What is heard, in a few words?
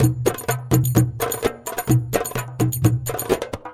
Latin House Loop 128 BPM; Vengeance Samples